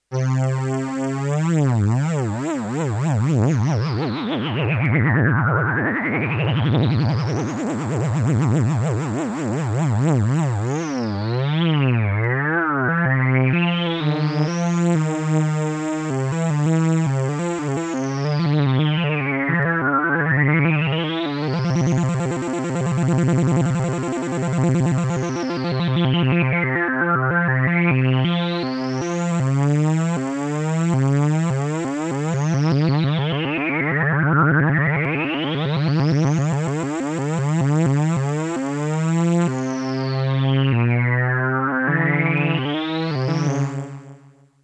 test lfo, akai s2000

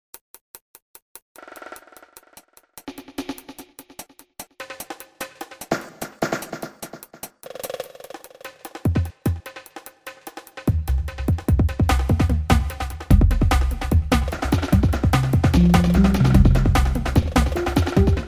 Superbeat performance, Emu Xtreme Lead-1

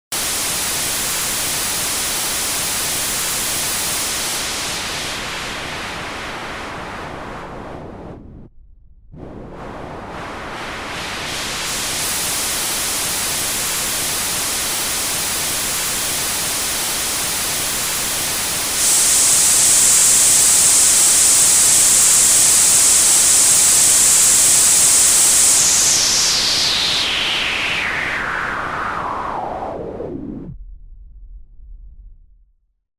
xp lowpass, roland jv880

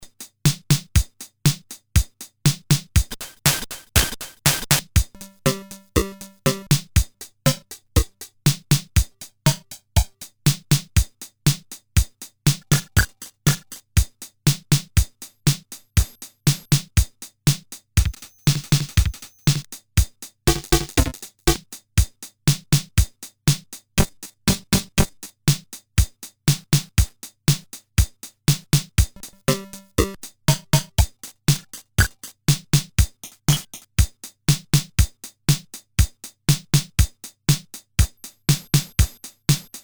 bending

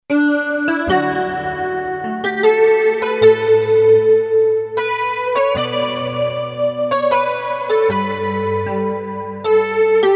korg m1r synth; cpu NEC-V50 (part of 70216 family) manages 16 voices polyphony

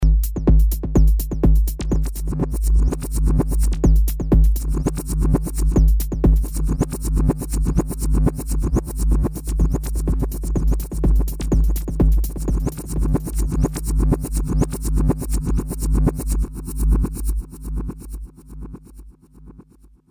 reverse, roland sde330; reverse 1 loop

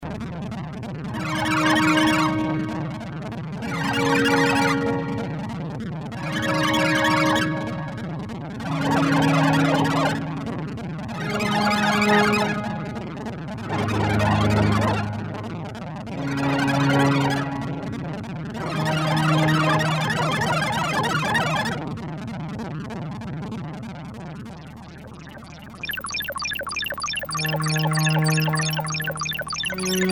pad lfo, Kawai sx240

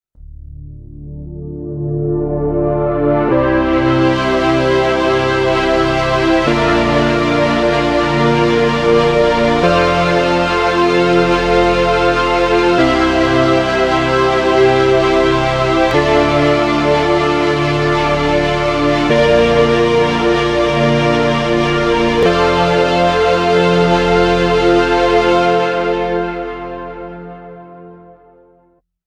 Korg DSS-1

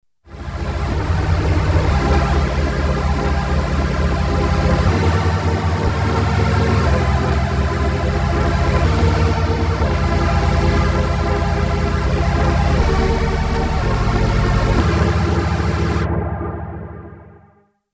pad mystic; Solton SM100

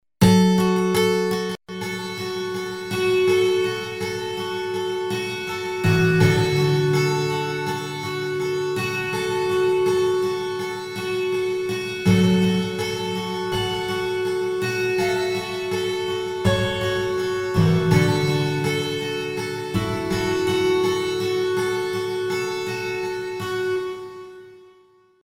reverb guitar, boss sx700

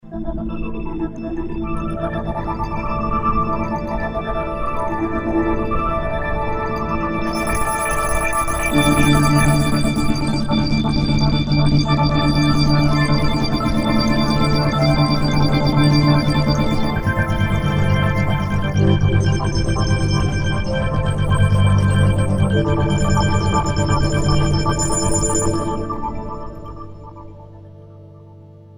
synth curved, roland jv2080

